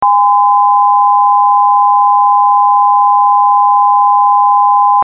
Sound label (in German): Sinus 816 und 1020 Hz